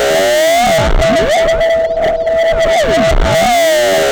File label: Ring Sweeper; Sound FX